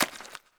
zombie ghost footstep; ghostfury

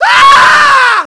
male infection

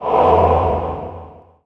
confuse; d2lod

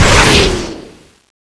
attack rocket shoot

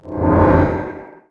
burst of speed; d2lod

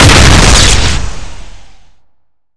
beam gun exp

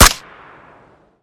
m4 silenced, weapons; lgk glacier

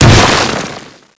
landmine; fallentitan

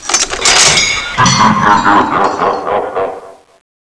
attack m134 start

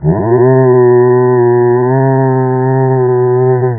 zom

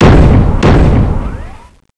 attack flame; mad machine

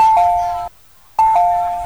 open

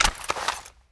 acog clip out, weapons

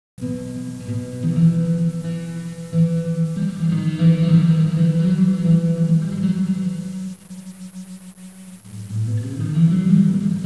dust music, ambience

